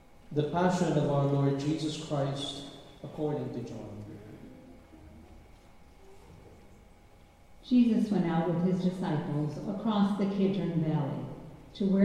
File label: Gospel